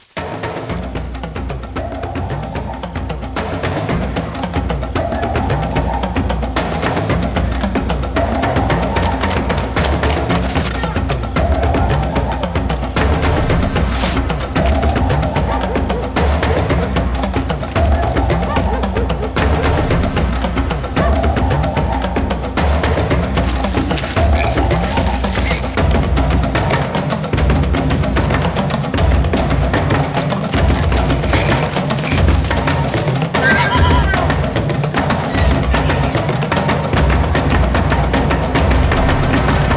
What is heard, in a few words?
BGM